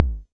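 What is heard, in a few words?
mattel tom, Mattel Synsonics drum kit, lo fi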